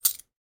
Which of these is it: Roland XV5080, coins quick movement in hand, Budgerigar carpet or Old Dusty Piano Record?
coins quick movement in hand